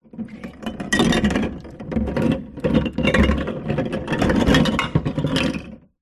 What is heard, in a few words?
food drink bottles beer in plastic esky filled water movement; zapsplat